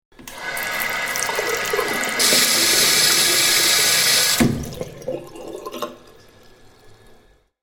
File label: Tap, Turning a tap on and off.; Kitchen; Household